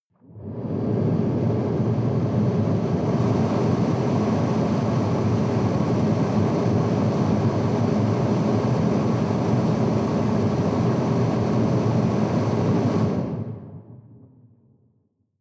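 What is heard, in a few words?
sports car interior ventilation hard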